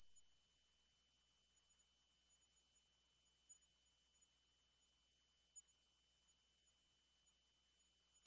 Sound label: teen buzz